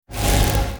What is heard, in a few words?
malice blast effect